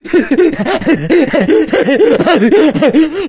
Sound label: jockey lurk; voice; idle; Left 4 Dead 2